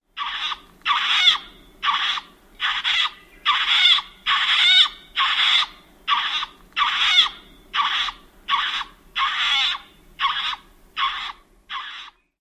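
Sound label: Great Gray Owl alarm screams at nest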